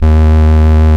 VOX 8BS EB1, VOX CONTINTL, Vintage Keyboards; Big Fish Audio; First Call Vintage Keyboards

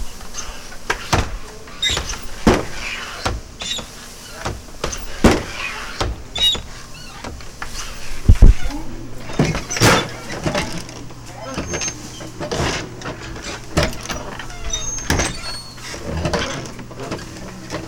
swinging door; E-MU Producer Series Vol. 3 – Hollywood Sound Effects; Human & Animal

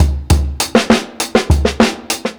60'S STYLE 100 BEAT, DRUMS LOOPS; BASEMENT DRUMS VOL 2

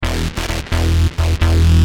Heavy a1, Bass; Industrial

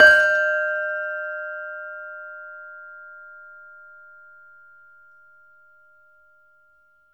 LAMEL D4 -R; USB Soundscan vol.60; AKAI; LAMELOFO

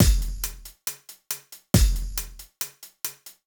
Beat Kick & Hats, Grime, 138bpm; Zero-G Sound Sense Grime Scene; Construction Kits; Da Suspects

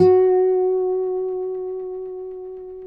Finger F#4 L, Guitars; Spectrasonics; Hans Zimmer Guitars VOL-1

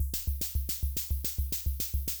Kay R-12 Rhythmer Loop, Drum Machines; Kay R-12 Rhythmer Sample Pack; 300 Drum Machines

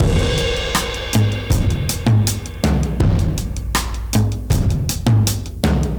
Drums Alt 80, 80bpm; Loops n Lines

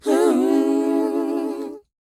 WHOA B, Vocal, R&B & Dance; ILIO; Vocal Planet

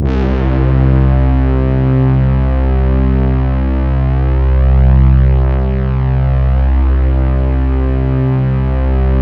C2, Synth Line; 90 s Sample CDs; NiceBeats; SynthLine VOL-26

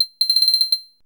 GUIRO; DRUMS & PERC

